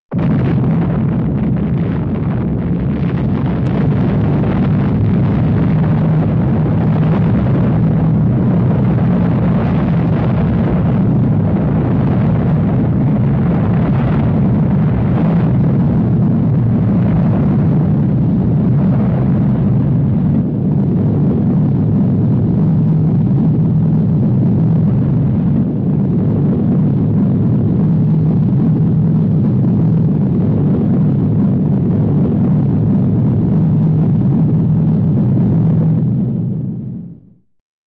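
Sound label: atomic explosion; Звук взрыва от бомбы или снаряда. Атомный взрыв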